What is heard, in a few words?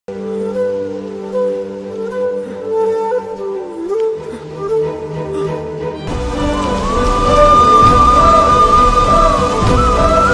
love bgm; Kotha Bangaru Lokam